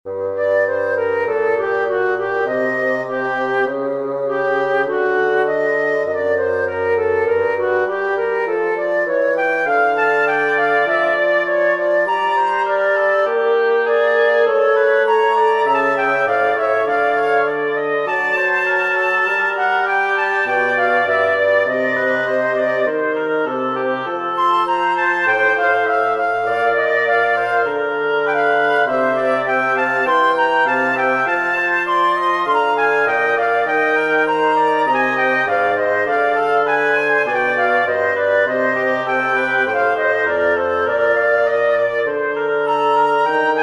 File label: trio minor for woodwind trio